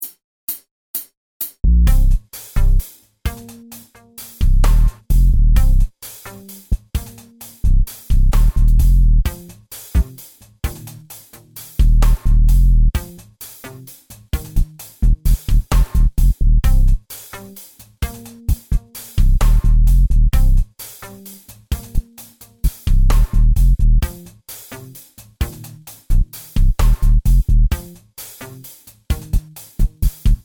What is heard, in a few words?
Drone